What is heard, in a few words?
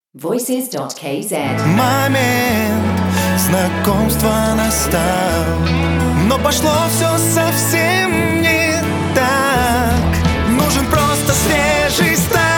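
Russian vocal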